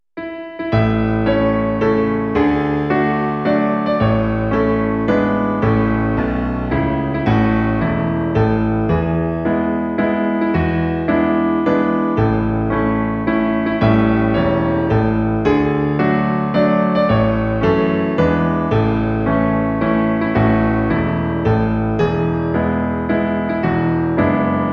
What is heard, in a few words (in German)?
Zum Geburtstag viel Glueck in A Piano